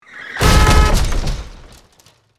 Car crash impact

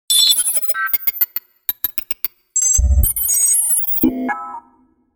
Futuristic data calculations medium

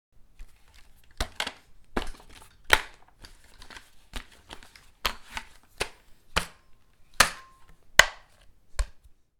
Sniper rifle handling foley movement

